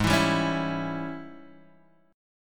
G sharp Major 7th Suspended 4th Sharp 5th, Guitar, Half Step Down; chord